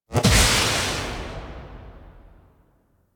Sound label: Impact; Warframe; Tenno; Grimoire